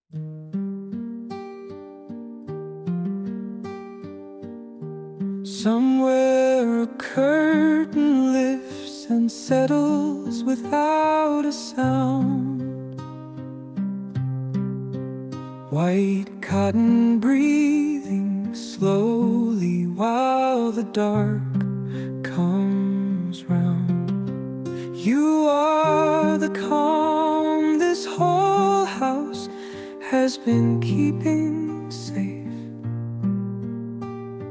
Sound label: lullaby, name songs; abigail